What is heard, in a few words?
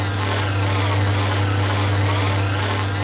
alien laser